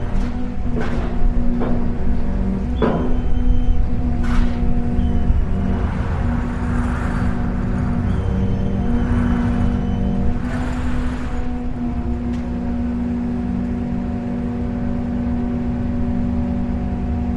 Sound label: boat docking